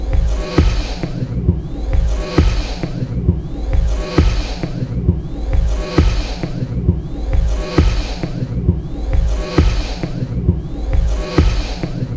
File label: loop